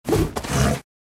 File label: Tiger Dodge